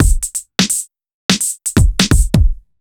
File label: Kit Heavy Swing, 85bpm; off the grid